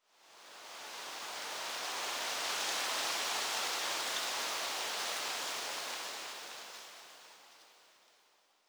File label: Tree Rustling; Survival Game Sounds - Lite Edition; Environment